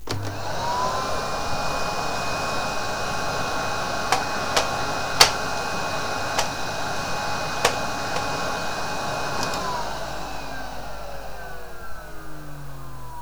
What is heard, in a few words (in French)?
aspirateur; cstrike; kitchoon